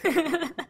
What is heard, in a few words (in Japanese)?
笑い; INFINITE UNDISCOVERY; ルゥ